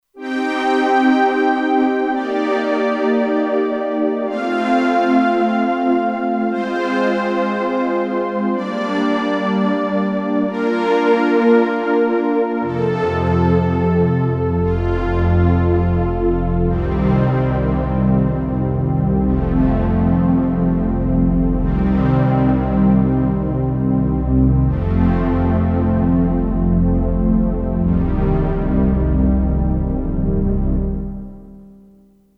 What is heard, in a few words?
Synth Strings, Kurzweil K2500X; Factory Programs